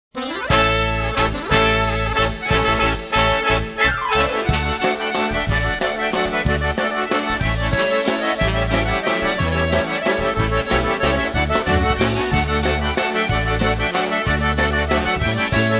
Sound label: oberek